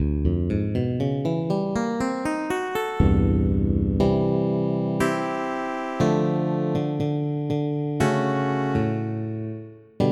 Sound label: Fingered Bs.; FF7